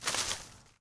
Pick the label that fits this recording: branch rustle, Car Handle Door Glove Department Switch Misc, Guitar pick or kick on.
branch rustle